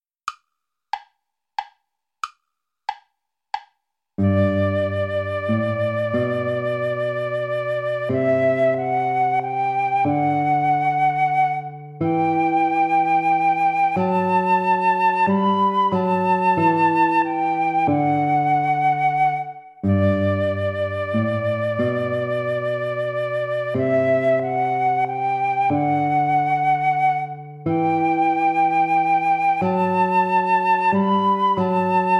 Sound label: sarabande play along without guitar